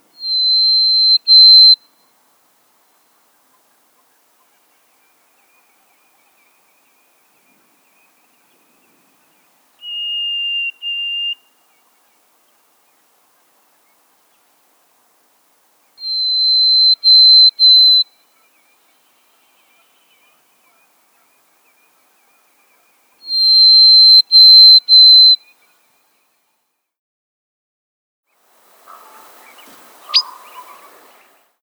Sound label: Harris's Sparrows